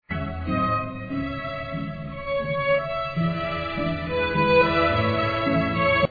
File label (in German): walzer